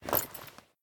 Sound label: Armor; smite; raijin